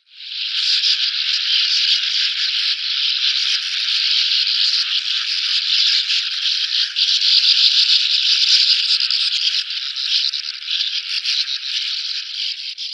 Sand Martin calls

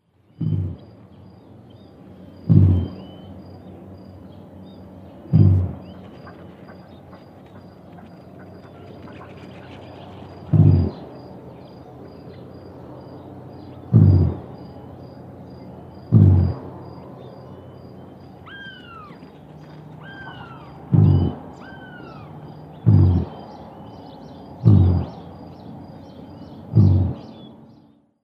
Houbara Bustard male display

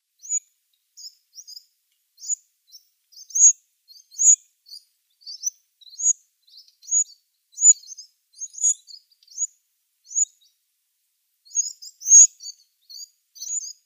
Winter Wren nestlings

